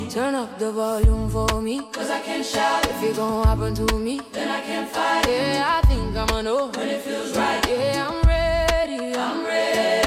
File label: Choir Version